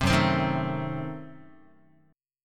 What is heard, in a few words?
F sharp Diminished 7th F sharp Guitar; strummed; JGuitar